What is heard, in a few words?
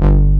DEEPER BASS, Synthsonic; Pro-Rec - Synthsonic; BASSES